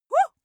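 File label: female attack wuh, screams; schreie; menschen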